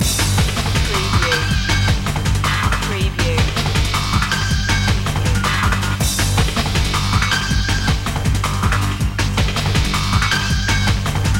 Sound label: shock load loop